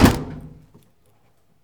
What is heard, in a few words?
Hit Generic Heavy Metal